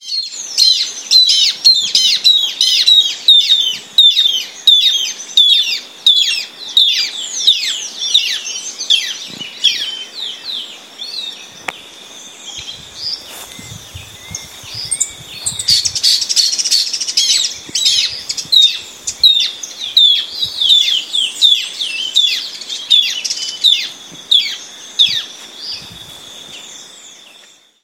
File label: Phacellodomus erythrophthalmus; PN Itatiaia RJ; Silveira